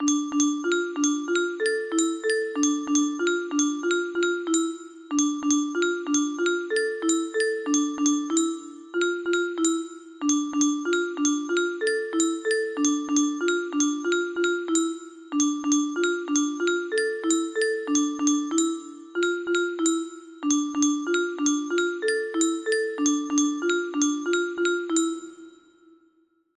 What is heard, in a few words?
music box melody